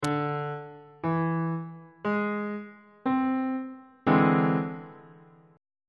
d double sharp augmented 7th 3rd inversion on bass clef